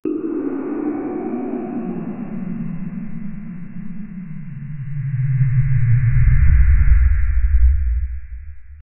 som de uma nave aliengena

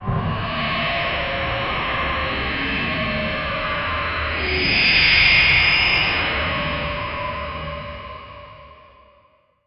som assustadores metalico, spaceship

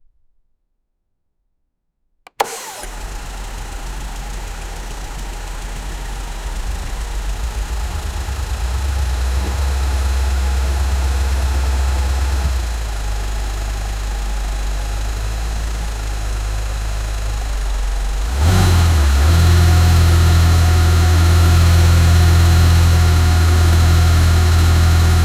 um motor w18 com 3

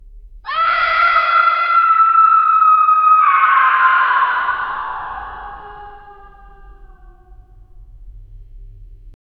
gritos abafados e distant, prison

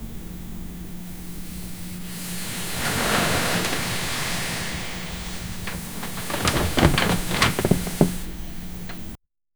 efeito sonoro de alguem d, sofa

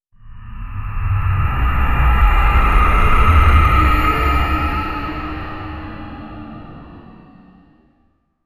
um efeito de suspense; lowering

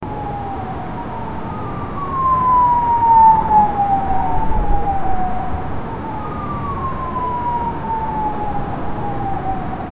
sons de uma noite em, tokyo

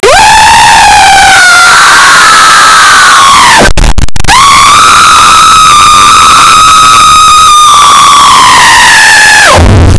grito de um animatronic

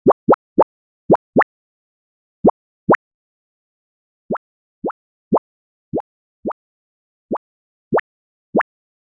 som de mola pulando estil

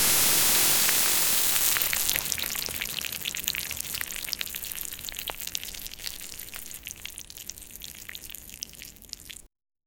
som de exploso de algo

